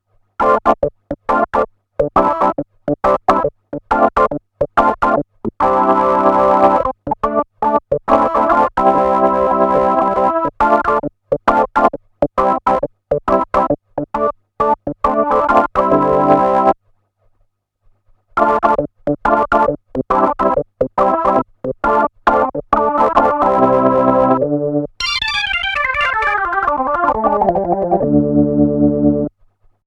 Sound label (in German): Juno60 Stereo Orgel; June60